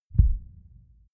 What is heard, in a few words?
synth low boom; whooshes rises; hits impacts